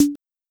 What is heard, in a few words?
Tom, Fricke MFB 502